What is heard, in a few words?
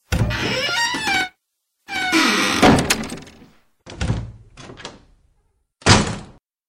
Door; Tardis